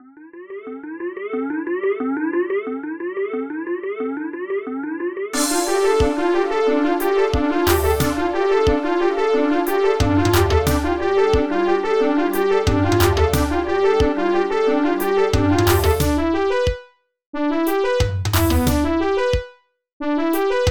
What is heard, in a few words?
boss battle